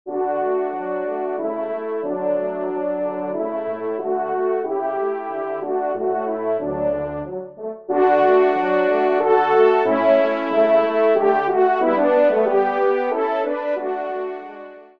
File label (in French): Trio Cors; Jean-Joseph Kenn (1757-1840); Trio de Cors ou de Trompes de chasse